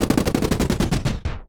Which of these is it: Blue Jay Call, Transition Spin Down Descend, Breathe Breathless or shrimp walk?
Transition Spin Down Descend